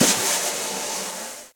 Snare Brush Brushing ClubSizzle; Snare Articulation; Drum Hits; Session Drums Club; Standard Hits; Ableton

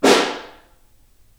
SN Far, Snare

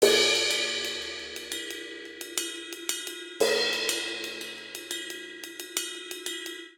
Straight Five Rock Cymbals Only 5/4 177 bpm; Ableton; Factory Packs; Drum Booth

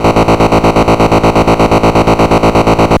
Pitfall Trem A0; Ableton Foundation FreePacks; Foundation Glitch Toys & LoFi

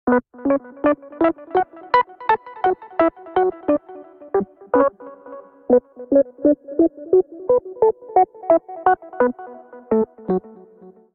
Whirl Piano B Minor 86 bpm; MIDI Clips; Tonal; Melodic; Muddy; Ableton; Factory Packs; Chop and Swing

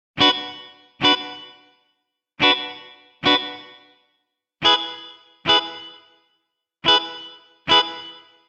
Guitar Chill Bm 108bpm; Guitar and Bass; Ableton; Factory Packs

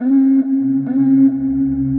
Connemara Throat Song, Voices; Ableton; Glitch and Wash

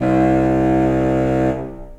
Contra Bassoon Solo Legato; Ableton; Orchestral Woodwinds

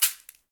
Caxixi Open; Latin Percussion; Drums; Drum Hits; Shaker; Ableton; Factory Packs

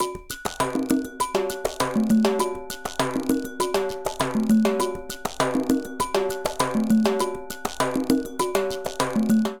Plena 100bpm, Latin Percussion; Ableton; Factory Packs

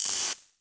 Lo Fi ratchet; Loafa; Ableton Foundation FreePacks; Wicked Kits

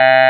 android, Digital Synths

